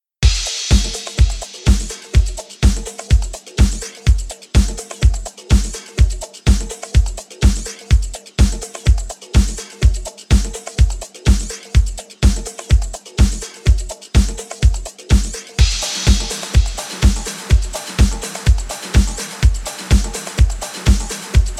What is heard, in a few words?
bass mix